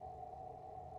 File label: reel Spin